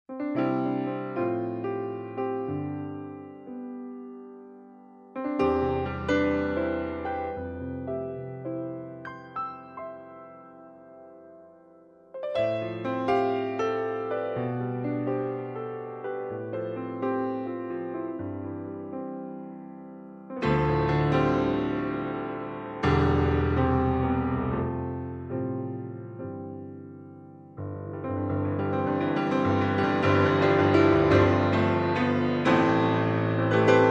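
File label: Natural Steinway; VS Comparison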